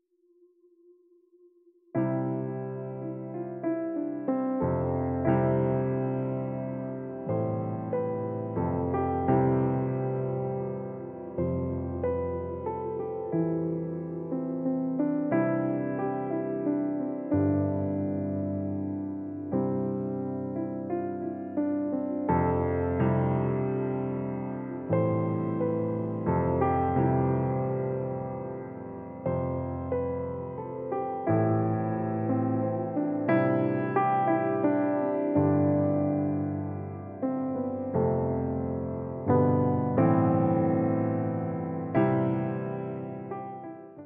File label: Piano Only